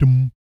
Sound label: BS DMM, Vocal Planet Jazz & FX; ILIO; BASS DUMMS